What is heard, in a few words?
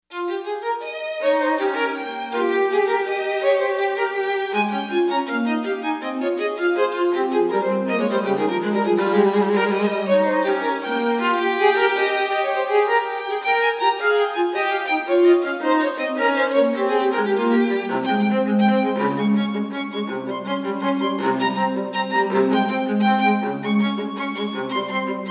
String Duo